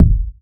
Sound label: raw kick one shot f sharp key; kick drum samples; one shots